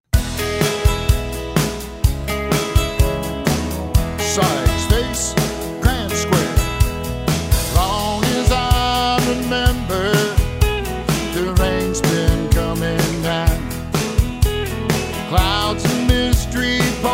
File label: vocal